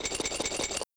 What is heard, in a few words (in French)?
Chaines rapide